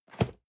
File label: cardboard box medium place slide; objekte